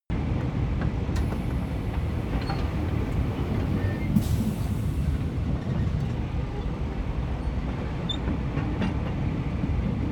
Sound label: train locomotive drive slow loop